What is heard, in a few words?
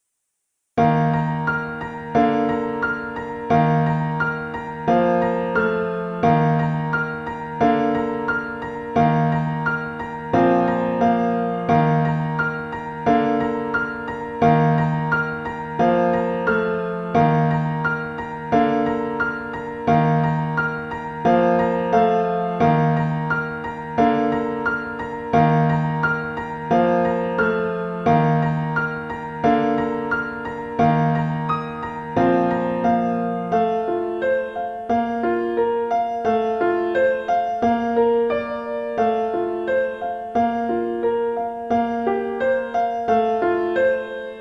sunrise accompaniment; elementary solos accompaniments